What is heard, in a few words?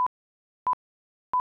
ADR beeps